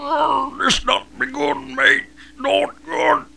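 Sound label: dying; NWNDS; korgan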